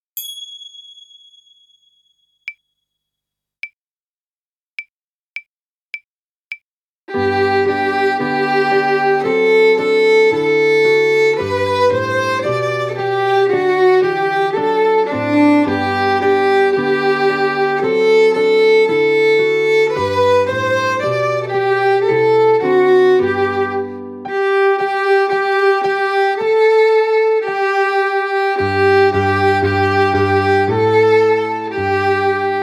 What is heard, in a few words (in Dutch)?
Viool